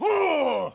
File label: GRUNT